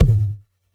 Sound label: Tom; Ableton Live 12 Lite; Core Library; Drums